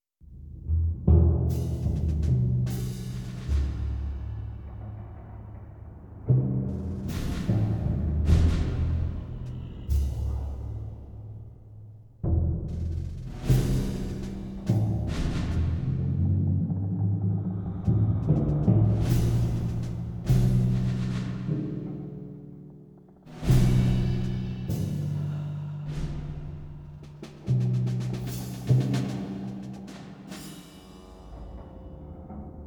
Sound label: M Drums; Return to Castle Wolfenstein: Operation Resurrection; PS2